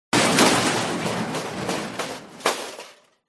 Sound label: crashes metal; Audio Mangler